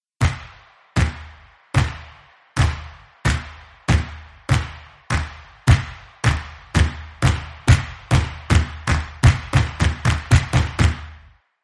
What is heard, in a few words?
hype stomp